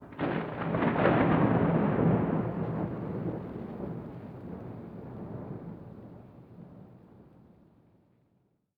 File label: Weather Thunderclap; ST450; ambiX; Rain and Thunder